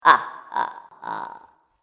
Witch Cackle, Evil Laugh; horror sound effect; Andrew Fleming; Twistedtracks

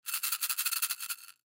Baby Rattle Shake Shaker Beads; Toy; Miscellaneous Toy

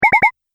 Multimedia Triple Button; Business Programs, Apps And Computing Noises; Andrew Fleming

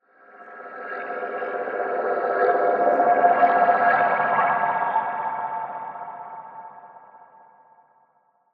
Swell Ethereal, Swell, Ghostly, Whispers; Swell Sound Effects; BlastWave

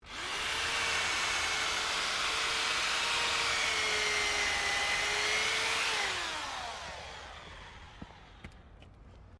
POWER TOOL CORDED DRILL WOOD; BOSCH

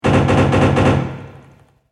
Machine Gun Fire; Machine Gun Sound Effects; Andrew Fleming

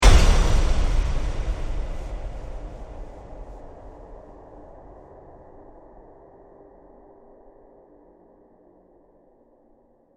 Hit Metal Hard Reverb Trail Off; Production Element; Sound Design

